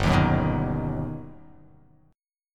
G Augmented Major 7th

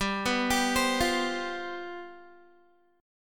G 11th, Guitar; arpeggiated

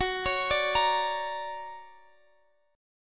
D Augmented Major 7th F sharp, guitar

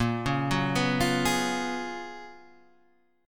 Gb Major 11th

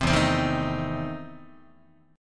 E Major 7th Suspended 4th Sharp 5th, Guitar

